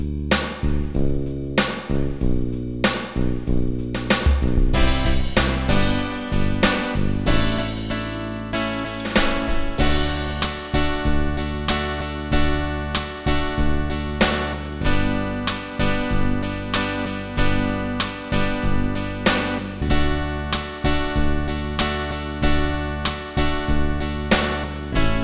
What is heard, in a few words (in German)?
Anfaenger Instrum; git; Majestaet